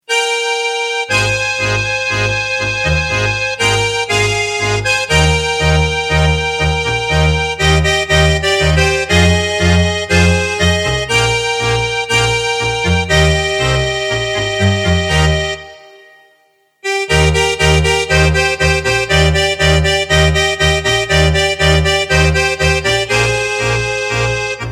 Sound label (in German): Zigeuner Tango